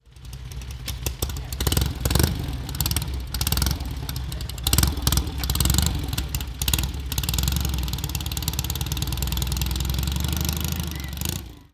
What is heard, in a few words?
Sunbeam S7a